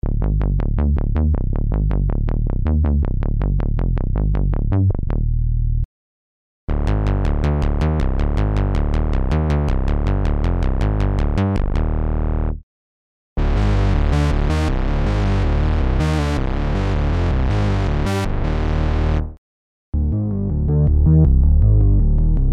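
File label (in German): HIP HOP Producer Bässe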